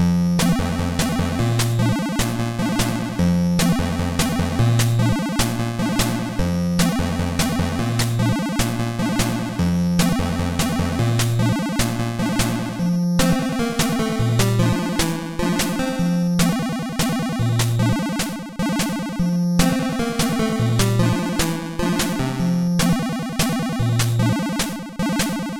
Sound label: menu jazz; mrrescue